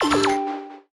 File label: Powerup Mushroom Trigger